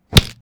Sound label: brutal hit